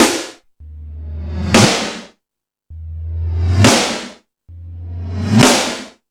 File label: Hip House, drum machine; E-MU Pro-Cussion-1